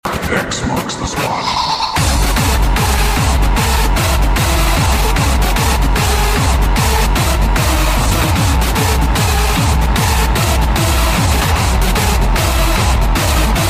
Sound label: Hardstyle